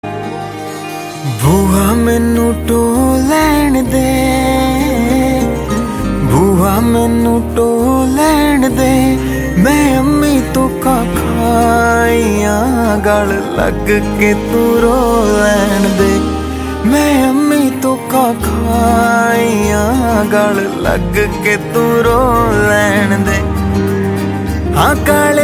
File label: latest punjabi ringtone